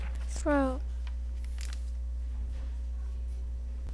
throw

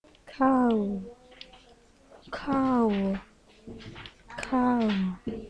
cow

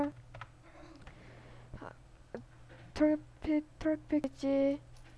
traffic

